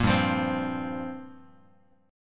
G Minor 11th, Bass Standard; Gm11 strummed; JGuitar

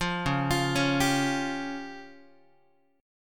Db Major Flat 5th, Guitar; arpeggiated; JGuitar

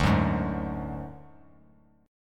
D Major Flat 5th D Bass Standard, chord; strummed; JGuitar

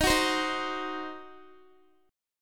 D sharp Minor 6th D sharp down Guitar; strummed

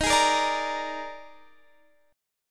Eb Major 7th Suspended 4th Eb; EbM7sus4; strummed

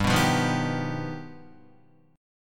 G Augmented 7th, Guitar; G+7 strummed; JGuitar

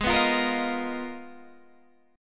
Ab Minor 6th Add 9th, Ab, Guitar, Open G

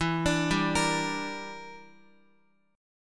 E Minor 7th Flat 5th E, Guitar; Listen to Em7b5 arpeggiated

